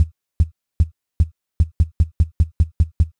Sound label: bass drum